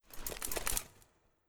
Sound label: Armour; Godess